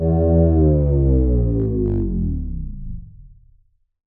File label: rock sweetener bend; Moai; Proton Pulse; Android; PS4